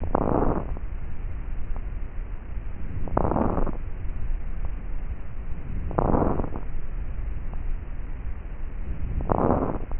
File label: fine crackle